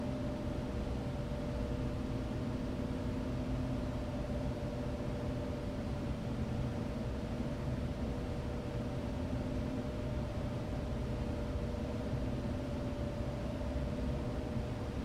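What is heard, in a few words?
close noisy unrestrained sound of production work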